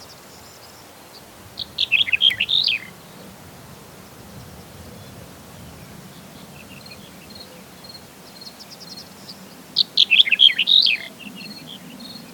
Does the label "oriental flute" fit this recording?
No